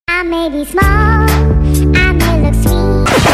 Cutie Tone

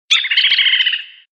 Bird

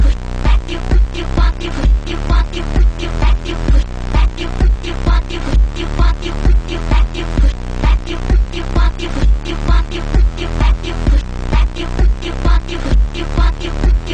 Dancing Beat

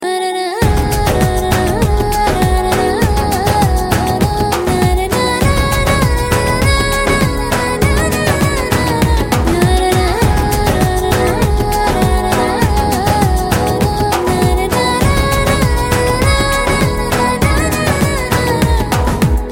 Humming

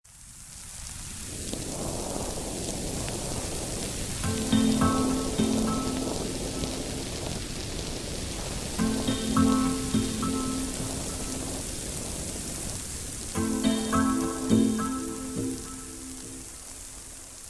Electric Rain

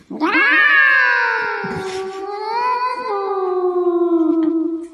Echo Meow

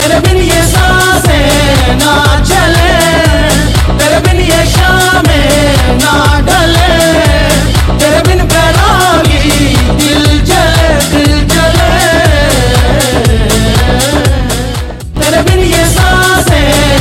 Male

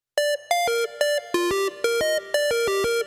Plop Plop

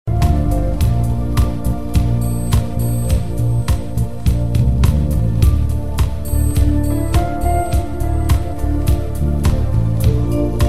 Instrument Tone

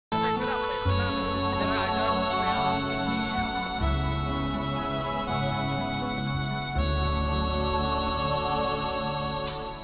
Dabangg Soft Guiter

